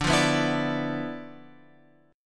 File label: D Minor 6th Add 9th D; Dm6add9 Chord {10 8 9 9 X 10} strummed